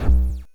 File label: Drums, Hits; DCM8